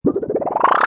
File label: Cartoon, Swirling, rapid rotating, unwinding twirl.; Cartoon Gazoo; Comedy/Cartoon; Funny Sounds; LynneMusic